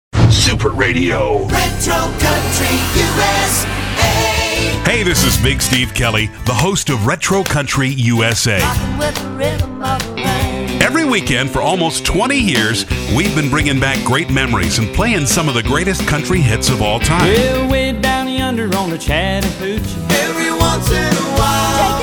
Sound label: retro country usa